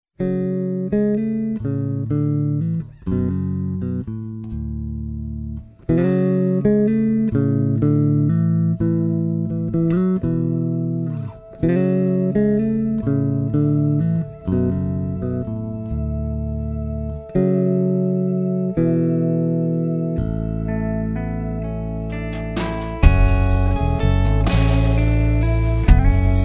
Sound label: ballad rounds both; lakland